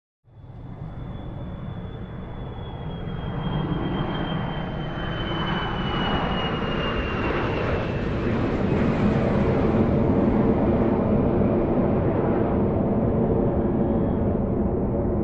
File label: Aircraft Whirring Sound Distant